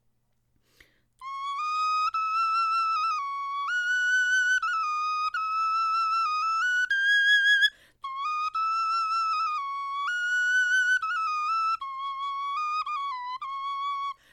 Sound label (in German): melodie